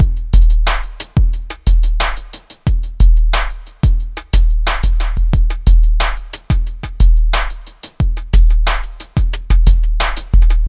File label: Spotted, chillout